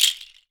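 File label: AFRICAN POD, SHAKER; Voice Crystal - Steve Reid Definitive Percussion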